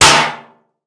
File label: Metal Strike; 1001 Sound Effects